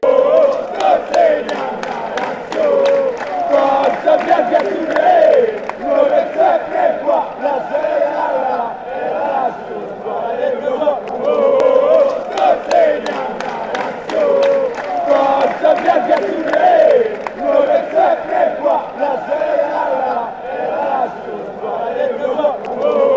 lazio chant

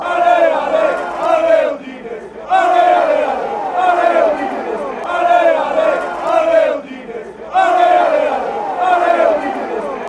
ale ale ale, fan chants

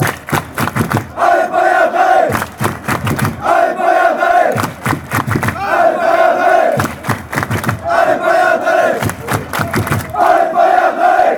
allez paillade, chants

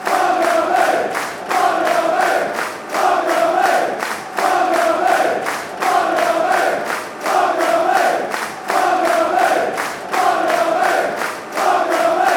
barmy army, chants, england

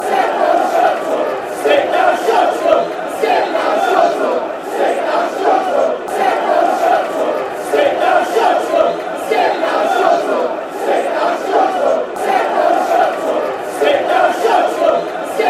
chants; sit down shut up

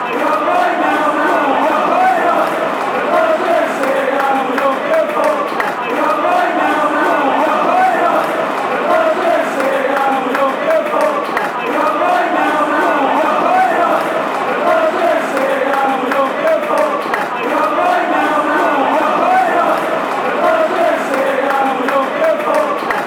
were not going down, fan chants

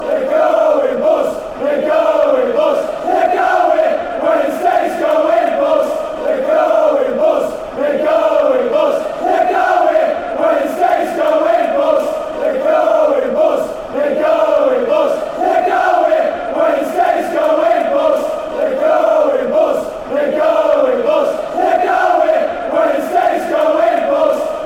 wednesdays going bust, fan chants

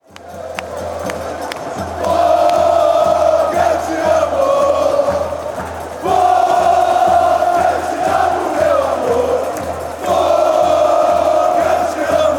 fan chants; fogo eu te amo

